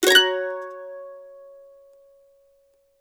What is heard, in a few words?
CEE CHORDS F#, Blues Guitar Samples